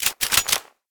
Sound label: reload sniper; ufoai; weapons